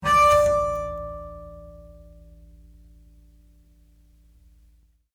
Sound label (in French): Harmo arco